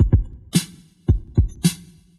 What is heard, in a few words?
metal on metal, Boombap drum loops